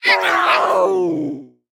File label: Lynx Death